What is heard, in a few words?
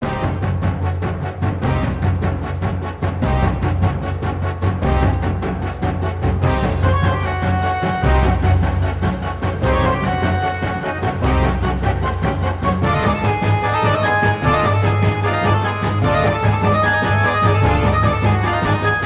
Victory; Warcraft 2